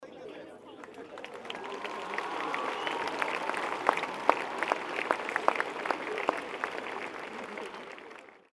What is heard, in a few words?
applause open air